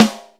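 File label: R-8 high tune snare; SN-R8-05 Jazz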